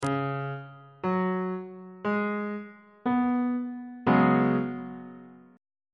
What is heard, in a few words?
d flat dominant 7th suspended 4th chord on bass clef